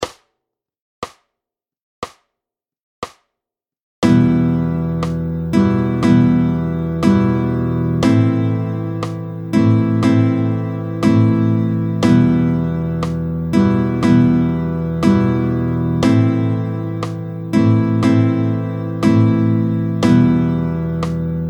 Les noires pointées (croche-noire-noire), battement tempo 60

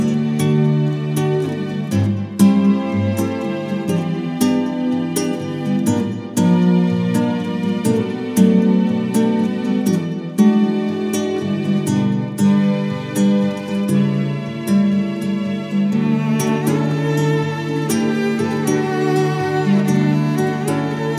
Violoncelle violons guitare

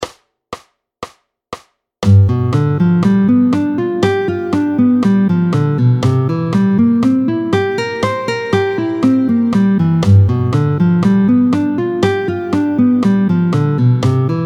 Arpège majeur 7 Sol 7 Do 7. Vite, tempo 120; arpèges au médiator; Les accords majeurs 7 Sol 7 Do7; Exercice chapitre 8 page 40

